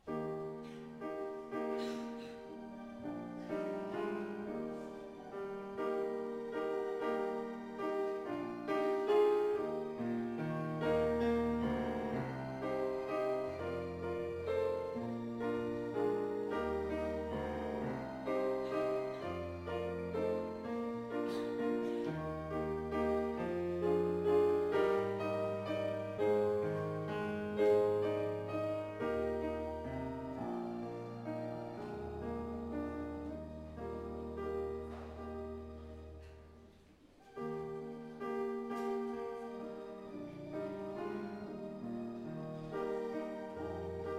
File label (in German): Vorspiel